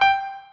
piano

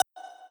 lead

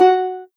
soft hitwhistle; osu

